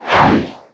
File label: luge; Trickstyle